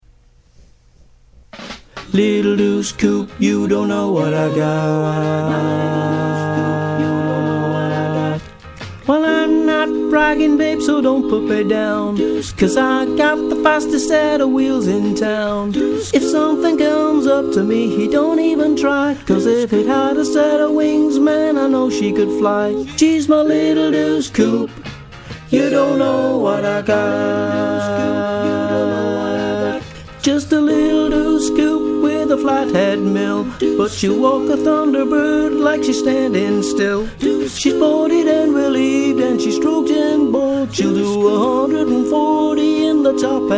Baritone; Choir Harmonix; Harmonies

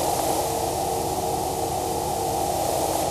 columns IR Steady State Filt; Dynamic DWM

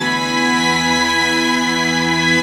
Strings; Dynamic DWM

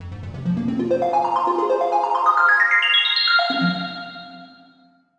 synth; Diffusion; Processed

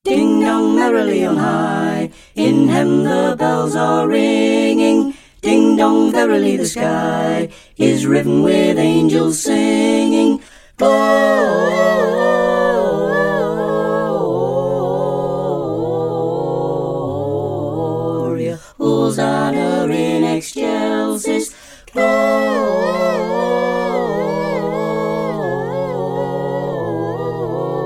Ding Dong Merrily On High, Choir; Choir Harmonix; Carols; Full Mix

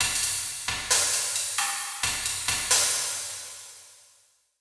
drum kit; Diffusion; Processed

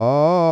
Aaaah, Vowels

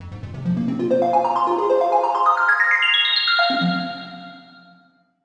synth; Diffusion; Processed

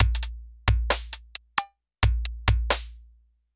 drum kit; Diffusion; Original

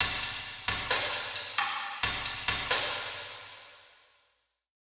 drum kit; Diffusion; Processed

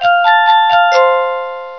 printer

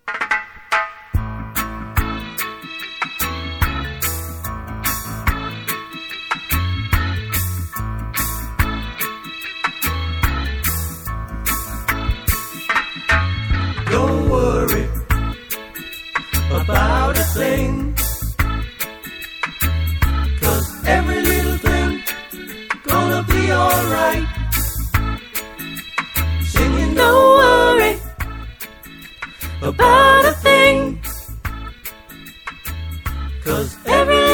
Soprano; Choir Harmonix; Harmonies

